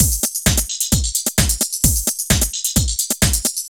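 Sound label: drum loops, right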